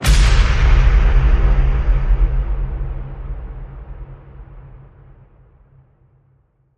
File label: Hit Big